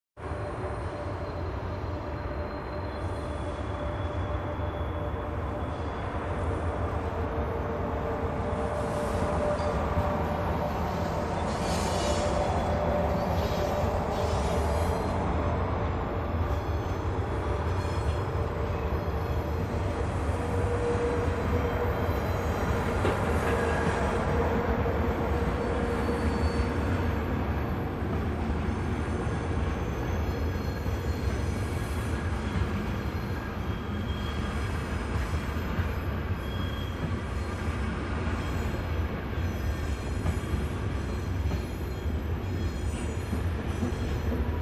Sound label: Train Station Ambience